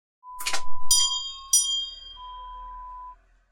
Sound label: Shop bell